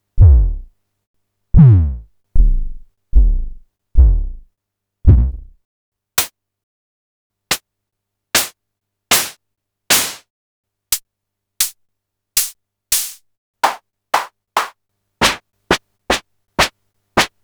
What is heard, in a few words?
Formanta Radio Plant Polivoks set2